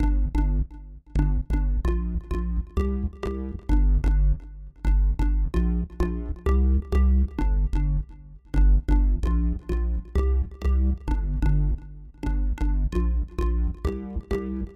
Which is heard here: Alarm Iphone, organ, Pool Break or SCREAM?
organ